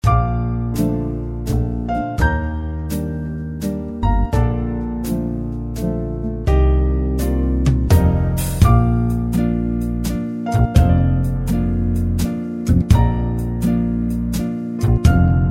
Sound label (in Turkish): korg pa3x, akustik; deneme